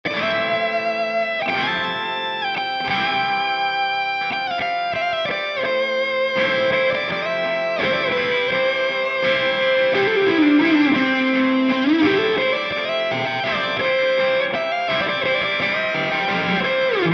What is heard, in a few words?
guitar amplitube